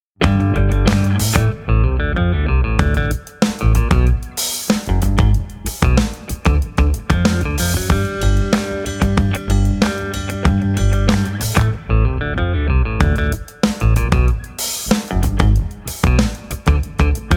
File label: Movin Up, guitar